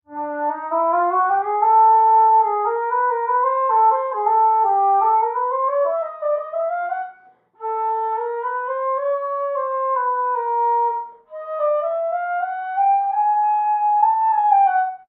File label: Wind Instrument; Assassin's Creed Syndicate